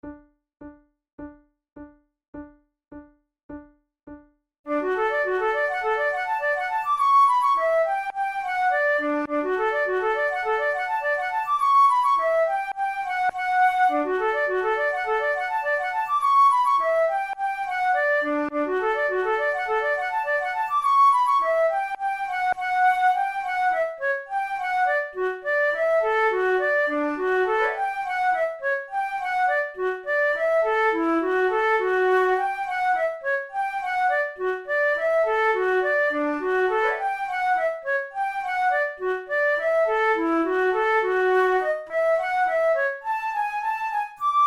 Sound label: gallopade duet, flute